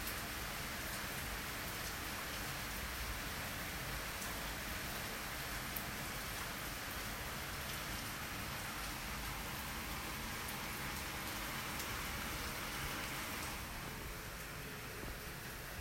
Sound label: Rain Nairobi; Rain in Nairobi: 29.04.19 | 20:53